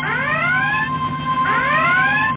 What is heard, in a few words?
red alert; TIFFany2